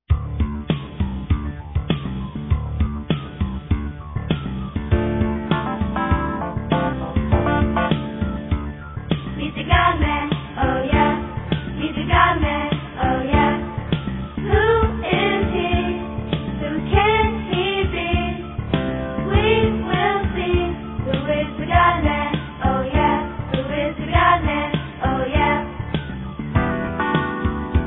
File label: with Vocals